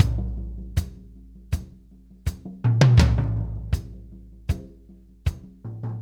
Tom Groove, Laidback; Sonic Foundry (Sony Creative Software); Drum Tools

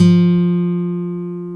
BRZ NYL, Brazil Samba; Roland L-CDC-01

